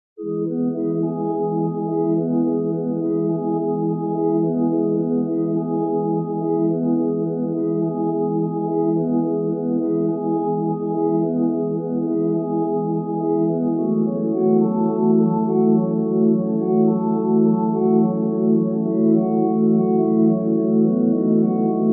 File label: Organ, accompaniment tracks